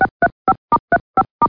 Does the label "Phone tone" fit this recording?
Yes